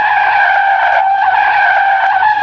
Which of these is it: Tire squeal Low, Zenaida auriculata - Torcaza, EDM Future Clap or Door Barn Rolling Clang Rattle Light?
Tire squeal Low